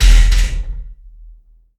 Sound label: Baking Ovens Stop